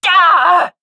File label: pain; awesomenauts